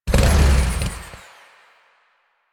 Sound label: Boost Stop, Motorcycle; Motorcycle Ninety Nine